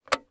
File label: On Off